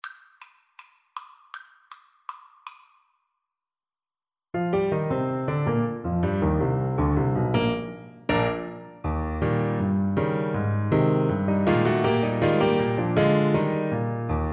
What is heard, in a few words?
Soprano Saxophone